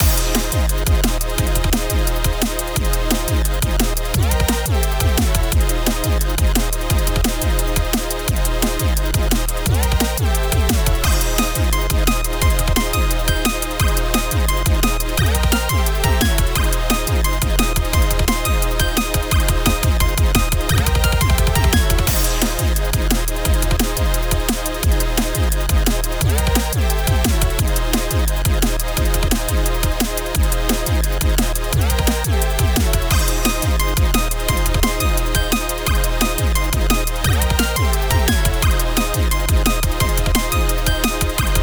m8 ambient jungle beat; weeklybeats 2026

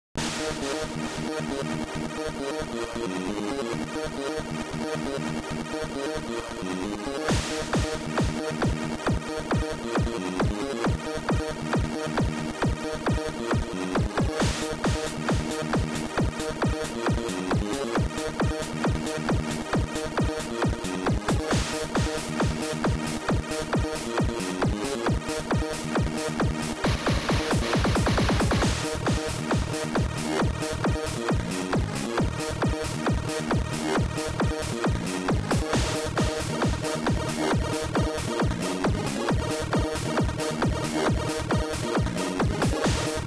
electrance; lsdj; weeklybeats 2026

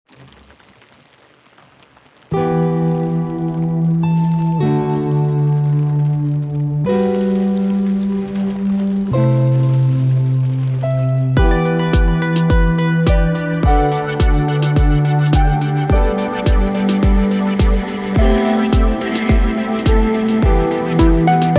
strings; weeklybeats 2026

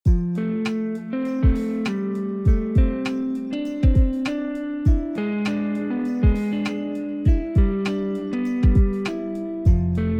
guitar things; weekly beats 2020

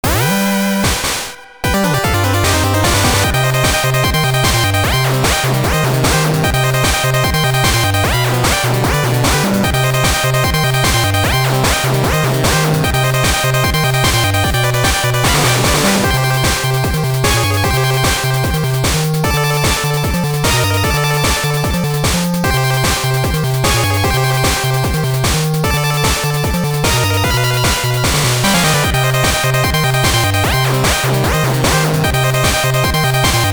battle theme victory fanfare; mad scientist

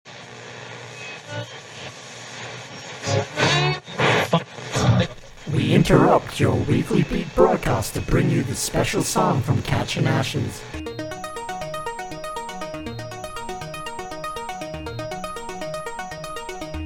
arp; magic

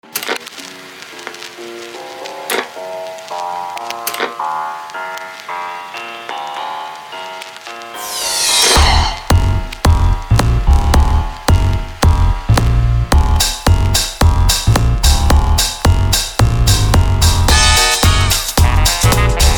one minute electro swing; weeklybeats 2024

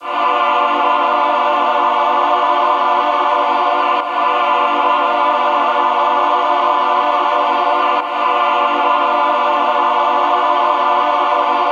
synth noodles

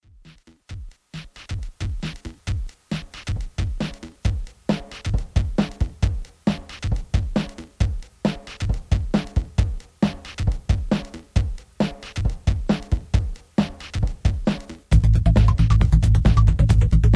bells and whistles; weeklybeats 2012

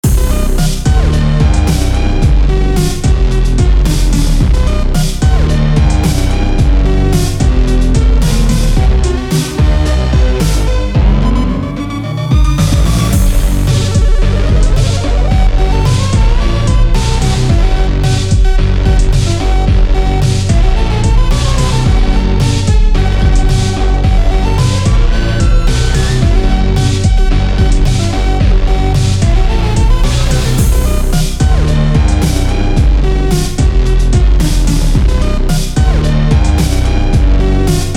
glitch

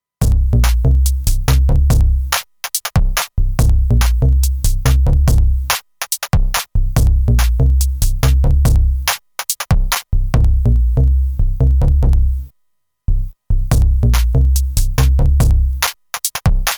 fm bass sketch nanoloop; nanoloop 2; weeklybeats 2026

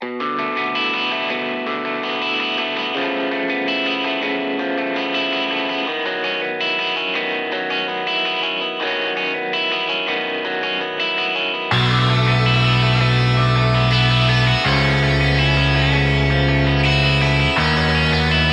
emo rock band intro only; weeklybeats 2024

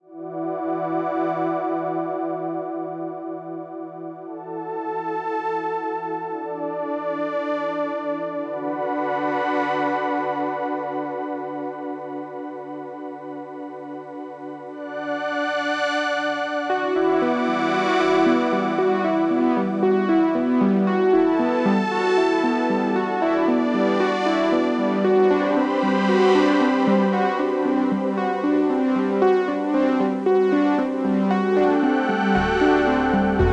boring synth sketch; ripoff; weeklybeats-2020

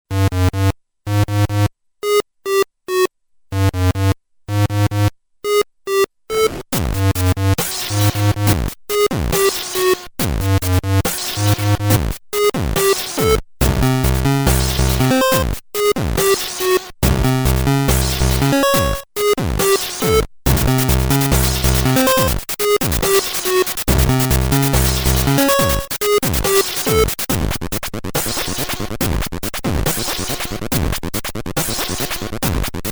dub; weeklybeats 2012

